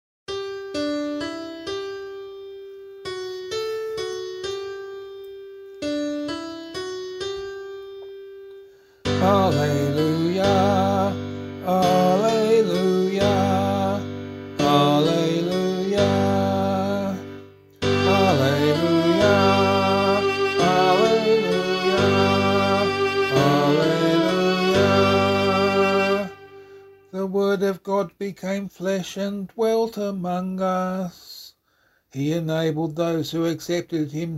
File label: Gospel, vocal